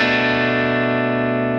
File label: Bm7(11)